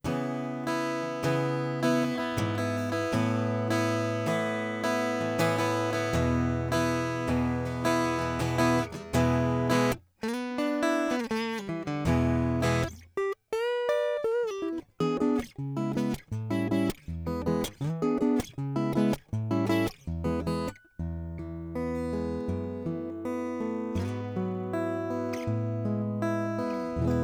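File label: alt guitar 1 di; altgtr1di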